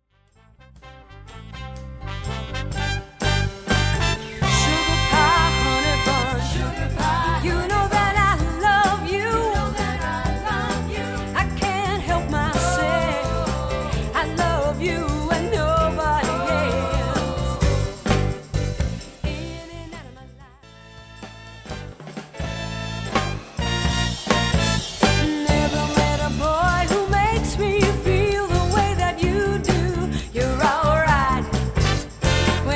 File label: soul medley vocals